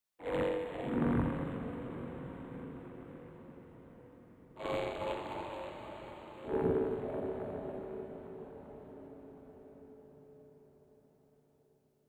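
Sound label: Music Hub Layer erosion distortion; Music Hub Layer erosion disto 11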